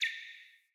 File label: Cardinal Male Bird Single Chirp